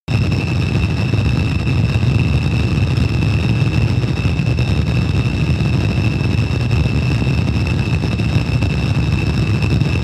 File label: military jet bomber afterburner damaged; Airplanes - Jets